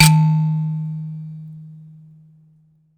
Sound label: Finger, Prepared Kit; Battery 3 Sample Kits